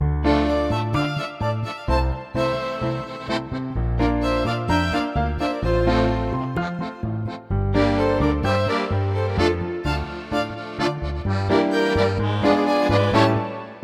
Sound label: tango; tango de salon